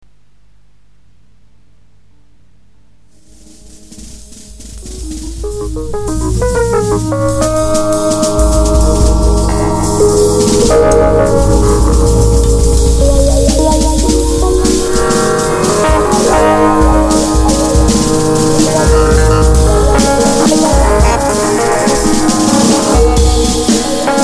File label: piano